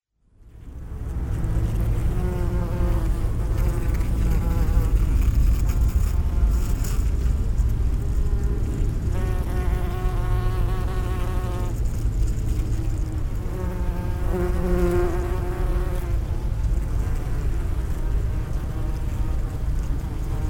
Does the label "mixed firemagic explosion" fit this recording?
No